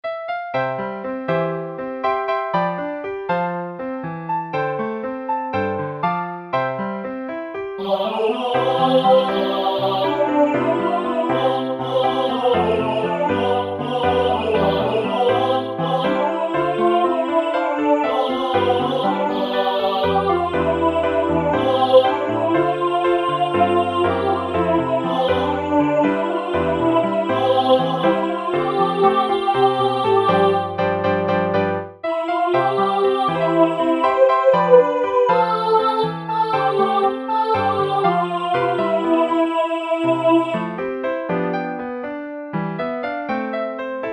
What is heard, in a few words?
piano